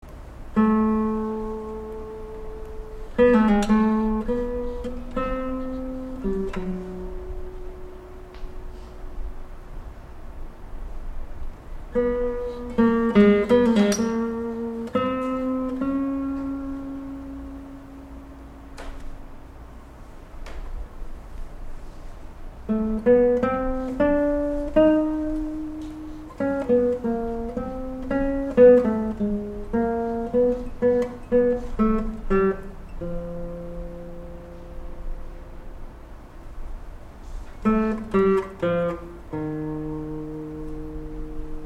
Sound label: Classical Guitar